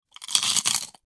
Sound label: chomp